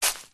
foley footstep single soft shoe dry leaves forest floor; zapsplat